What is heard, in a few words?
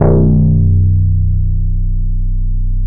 HUMAMOID BAS, Bass Analog & Synth; USB Soundscan vol.12